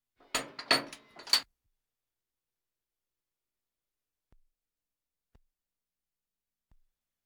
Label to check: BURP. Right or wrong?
Wrong